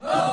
hoo; tempala

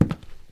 Indoor Footstep; Welcome to the Game

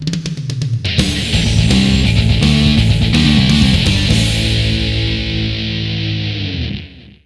Mission Failed; Airforce Delta Storm; Airforce Delta II; Xbox

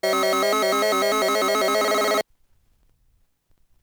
Miss; Phozon; arcade

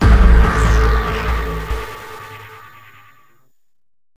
Sting; SeargentPart1

